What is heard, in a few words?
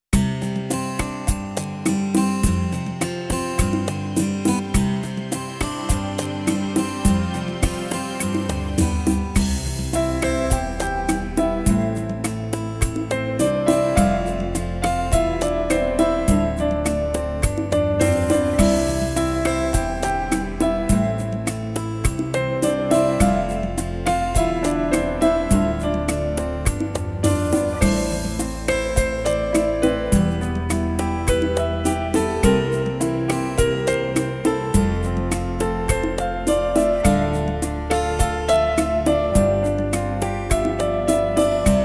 rumba